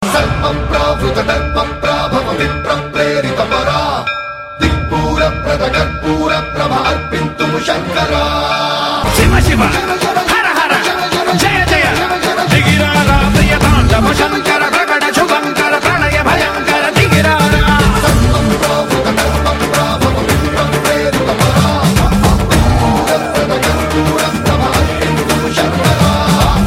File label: Damarukam